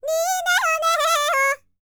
BLACK SEA, World Voices; ILIO; Vocal Planet VOL-5

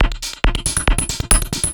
percussion loops, Techno, 138

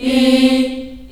C3, Choir, CHILD; CHILD IHS

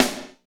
SNR, R&B Kits, Roland; Northstar - Drumscapes Roland; Wet Kit

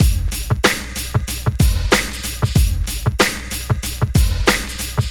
94 deep; AMG; Now CD-ROM (Roland); BLACKtoBLACK

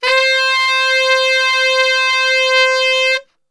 C 3 SAX STR, Brass; Best Service; Brass Super Section; SAX STRGHT

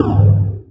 sound effect, film score; AKAI S6000 CD-ROM - Volume 1

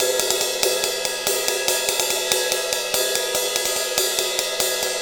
Ride Cymbal Pattern; Percussion; Essential Percussion; Ride Cymbal Patterns

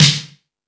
Fusion Stix, E-MU Pro-Cussion; drum machine

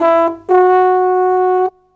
Horn Loop

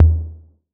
808 SOFT; Wizoo - Platinum 24 Electronic

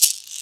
IN SHAKER; Ragga & Dub Reggae

